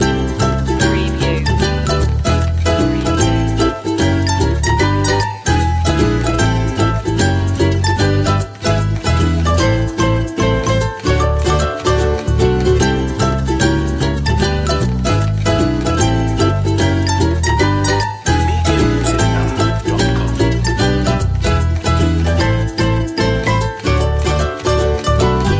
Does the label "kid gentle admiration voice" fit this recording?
No